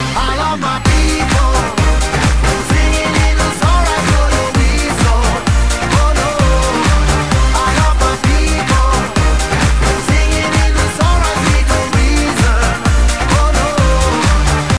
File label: human win; zombie plague; cso